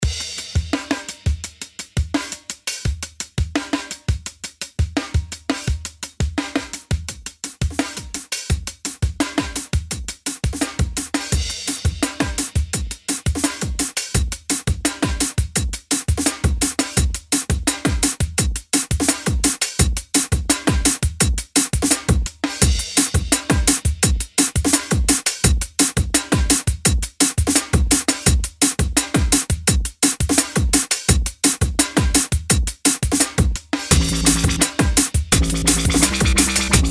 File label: Breakbeat noise, nu sensation strings; Martin Sehnal